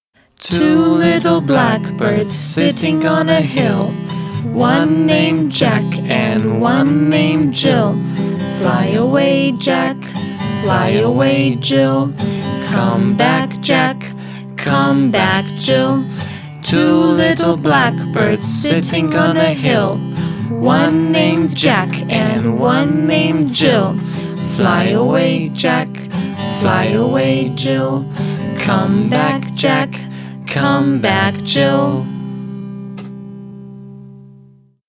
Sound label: two little blackbirds